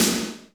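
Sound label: GATE SNARE; Crypton - DrumBase5000; GATE KITS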